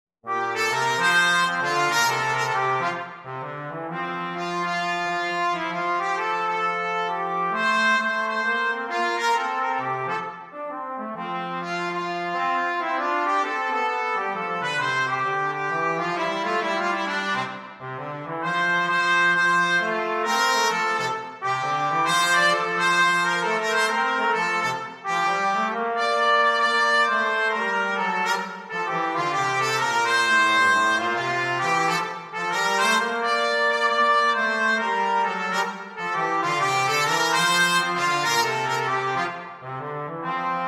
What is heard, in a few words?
jazz Trumpet Trombone Duet; swing; hark the herald angels